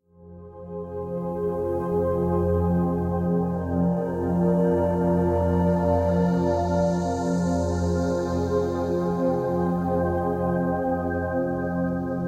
Ambient Space Atmospheric; Ceres

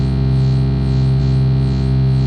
8bit Drive; Evil Gremlin; Gremlins Gizmo; wii

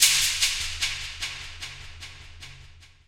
Shaker; Forest; Torchlight III; PS4

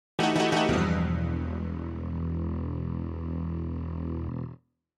MIDI Shock

